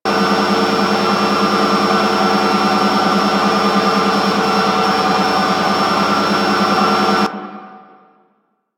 Ocean Steady Machine Loop; Ambience; Props; Warframe; Grineer Ocean

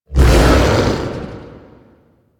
Axe Weapon Wind Up; Warframe; Tenno; Wolf Frame

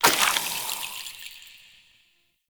Poison Shroom Spore Cast; Warframe; Nokko; Gyromitra

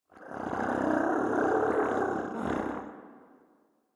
Catbrow Combat Vocal Idle; Warframe

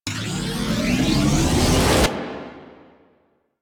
Charge Full, Weapons; Warframe; Archwing; Larkspur Prime

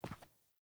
Grunt Boot Walk Default; Characters; Enemy; Melee Grunt; Footsteps; Boots; Sinecure

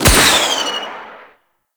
Fortress Rocket Fire; Ambience; Warframe; Grineer Fortress

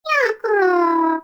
Baby Vocal Tired; Warframe; Nokko Colony

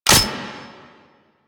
Sniper Mech; DE; Warframe; Warframes; Frumentarius; ExaltedSniper

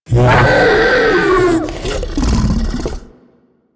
Ancient Tank Pain, Infested; Warframe

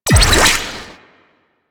Crp Ship Void Portal Off; Ambience; Props; Warframe; Corpus Ship Remaster

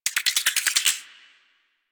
Tiny Creatures, Ambience; Warframe; Corpus Railjack

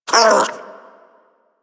Armadillo Vocal Pain; Characters; Warframe; Hunting Critters

